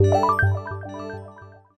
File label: Jingle Stage Clear; super rub a dub; ps3